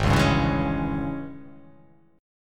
G sharp Major 7th Suspended 4th Sharp 5th G sharp, down